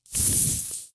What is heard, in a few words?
Air Leak